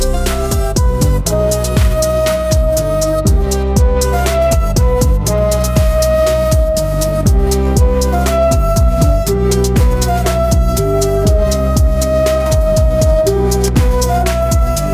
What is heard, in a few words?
upbeat pop melody catchy hook; ai